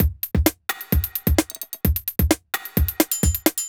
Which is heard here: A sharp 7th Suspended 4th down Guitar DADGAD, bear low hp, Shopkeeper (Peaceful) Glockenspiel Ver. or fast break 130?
fast break 130